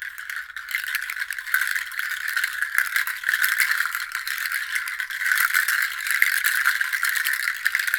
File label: water castanet; Spectrasonics; Heart of Africa 1; CASTANETS